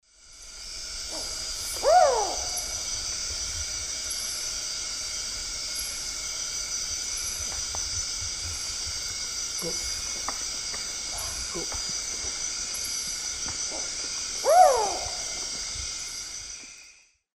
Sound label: Ciccaba huhula, call; S. America; Eastern Lowlands